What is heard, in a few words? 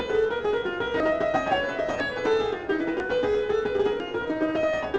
violin